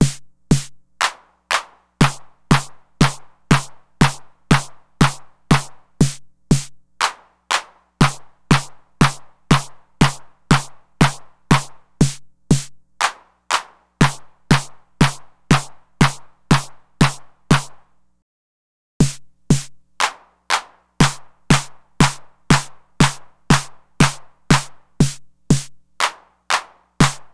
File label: 909 Noise Mod